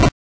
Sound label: hit, attack sound; カタナ１